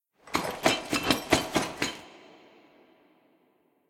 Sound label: walk run